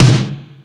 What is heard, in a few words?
All Snares, Drum Machines